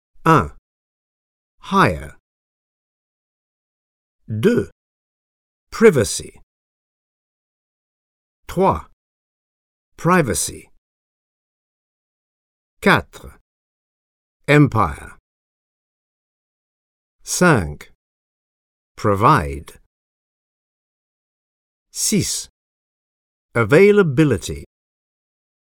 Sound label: techno